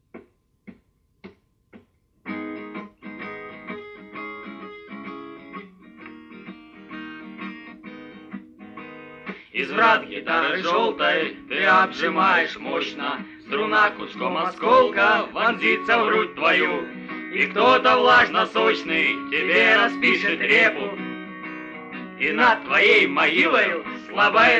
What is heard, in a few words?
unplugged